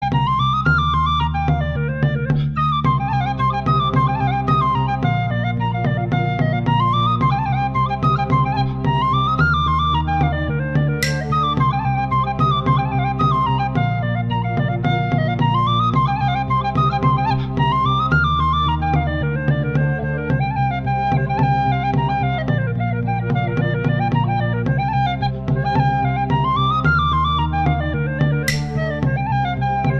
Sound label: Tin Whistle